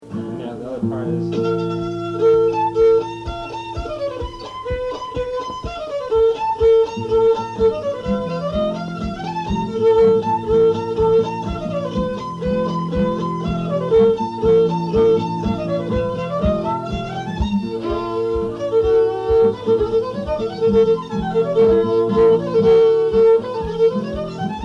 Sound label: Breakdown in A; greatlakes